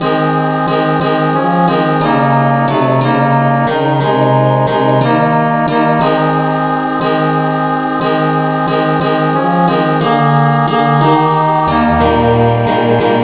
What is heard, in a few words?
angel voices rev no vocal key aes